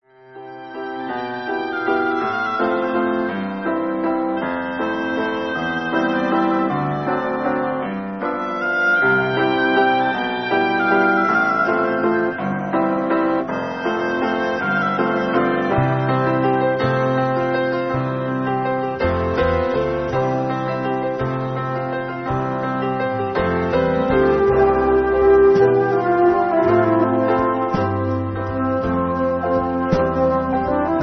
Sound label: Instrumental; In Store Music